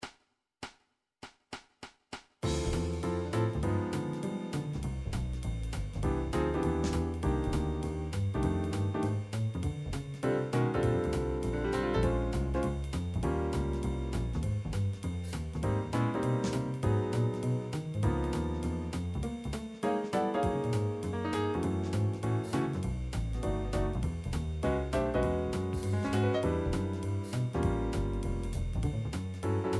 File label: jaz swing, midi; song book